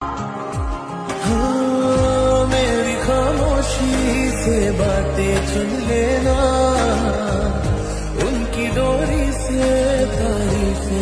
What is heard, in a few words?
Hindi